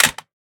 pistol drop concrete; STALKER; IWP

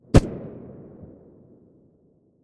glock17 silencer mono far

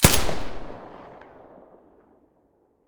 silencer ump45 mono, weapons

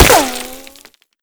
default, bullet collide; STALKER; IWP

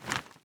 aim in, weapons; STALKER; IWP

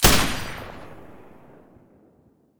silencer M249 stereo

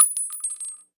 shell 39mm default; STALKER; IWP

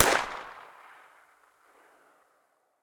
muzzle p220 mono far, weapons

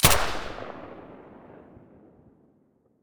silencer sa80 stereo

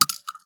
Shell 12ga gravel; STALKER; IWP

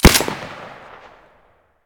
saiga silencer stereo, weapons

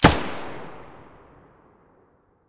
muzzle; S.T.A.L.K.E.R.; IWP; vityaz; pp1901

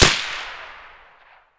mosin silencer mono distant, weapons

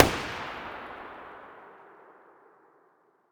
aug muzzle mono far, weapons; STALKER; IWP

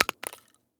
Shell 12ga carpet; STALKER; IWP

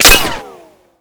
ricochet; STALKER; IWP

